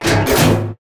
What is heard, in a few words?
door stop